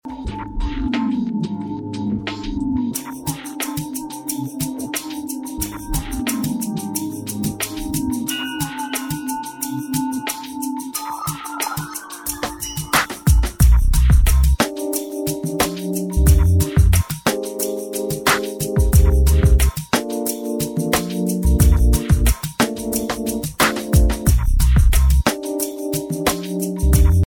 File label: Melody, RingTune